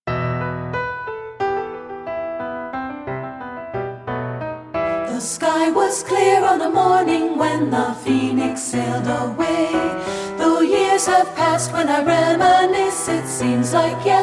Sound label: alto